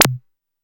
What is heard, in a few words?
Zap, Roland SH-32 Drumset